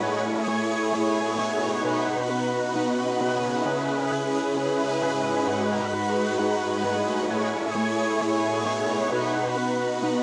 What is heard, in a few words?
132 BPM C# Min Chords; Melody; Reverse; Loop Stems; Cymatics; 2022 Melody Collection; Blessings